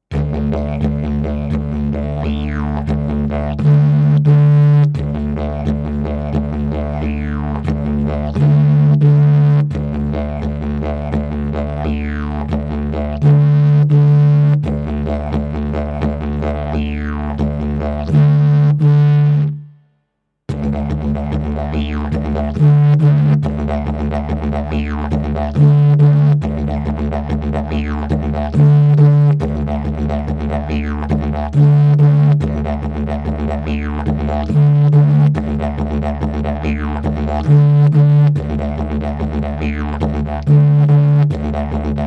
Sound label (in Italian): ritmo livello intermedio